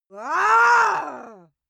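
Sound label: female aggressive, screams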